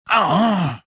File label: choke; reborn